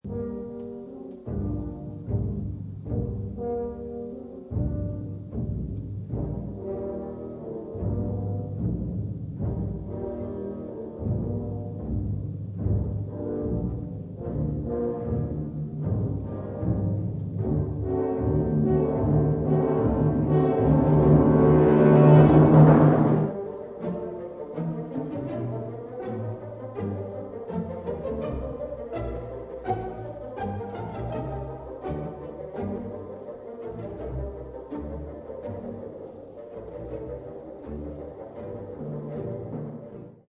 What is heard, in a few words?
tuba; castellana; infants; instrumentos